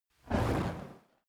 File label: fire torch whoosh fast soft; swooshes-swishes